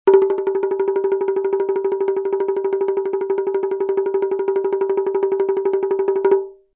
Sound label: Chinese Percussion; Big Fish Audio